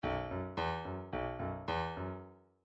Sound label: midi file grooves rock